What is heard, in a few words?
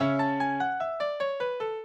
minuet, mozart